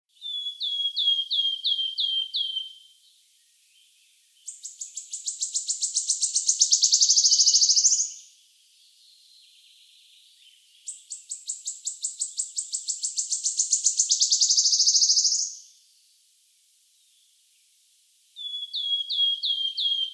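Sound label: wood warbler voice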